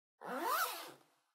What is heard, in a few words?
Stash Open